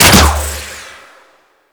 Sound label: Antler Bow Fire; Warframe; Weapons